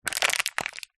household plastic cup disposable scrunch up

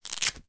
fabric wallet purse velcro open; foley

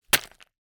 ICE Thin Hit Block by Hammer Strike

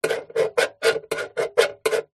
office pen biro draw shape on metal box

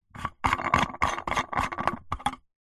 household lightbulb unscrew from plastic lamp

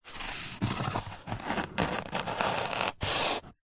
foley cardboard box lid flaps rub scrape together

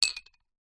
foley bottle top metal down on wood surface

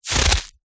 foley footstep single boot wet slushy snow

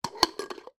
household glass jar lid unscrew

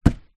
kitchen towel roll place down; foley

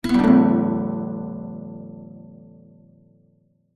guitar nylon out of tune single strum

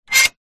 household gate bolt movement

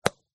Golf Shot

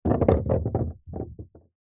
wood small heavy dense piece roll on wooden floor; foley

